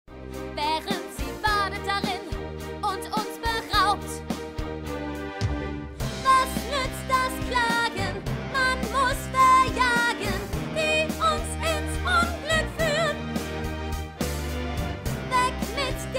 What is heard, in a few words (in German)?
Sopran tief